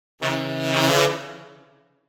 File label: Criminal Increasing Lower; Hollywood Edge; sound designer's toolkit v2